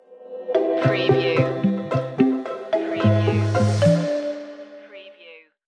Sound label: charmed stinger